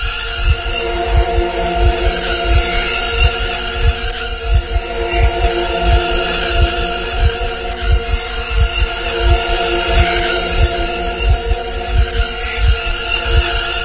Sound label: psy polter, anomaly; Soundscape Overhaul